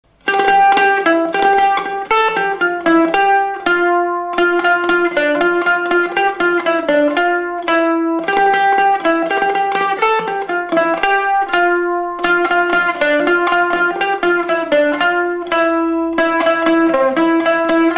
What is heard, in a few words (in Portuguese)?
Bandolim